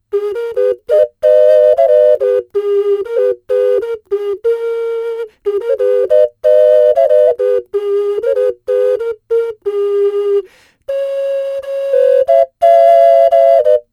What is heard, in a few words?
Tenor Duet Oh Susanna, ocarina